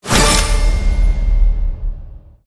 fortifications cash sell; gow; horde common

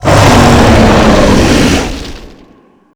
shout long

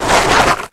drag

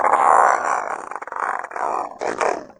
chatter, revanent; doom3

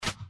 wingflap back; npc; cazadore

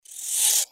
giant rat hiss; npc

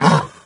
pain, doom3 pinky

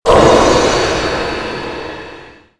magic soultheft

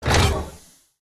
multiturrets lockon; gow

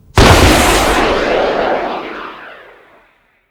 rocket fire, ah64d; wac

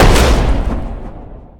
jump; gundyr

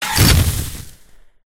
gunstand flamethrower fire stop; gow; turret judgement

